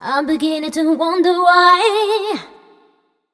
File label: f mel f, fem Vocals